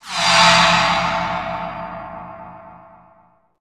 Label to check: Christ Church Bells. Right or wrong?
Wrong